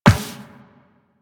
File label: Walk Metal Perforated, Footsteps; Warframe; Operator